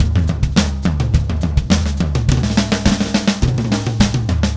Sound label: Beat Fill; Sonic Foundry (Sony Creative Software); Indie Rock Anthems; Session 02 - Clinical